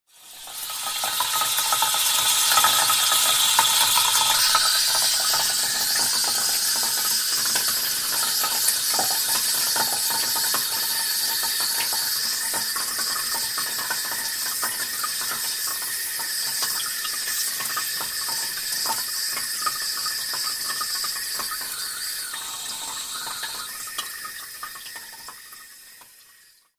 Water Cistern, Sound of water cistern in bathroom; Household

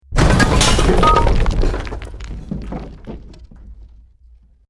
BUILDING COLLAPSE SCAFFOLDING SMALL; Created dramatic effects of buildings collapsing or breaking

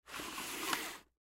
Wax Paper Box Slid Soft, Kitchen; Household; Blastwave

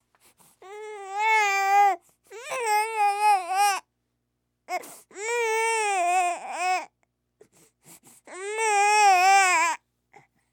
Human Baby Fuss Cry; Babies (Sound Effects:Voice)

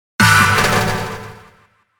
Hit Multiple Delay Shimmer; Blastwave

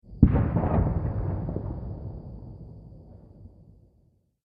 War Sounds Explosions, Bomb Sound Effects

